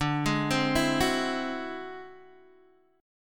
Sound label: D 9th Flat 5th D down Guitar DADGAD